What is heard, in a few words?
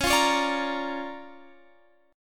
D Minor Major 7th Sharp 5th, C sharp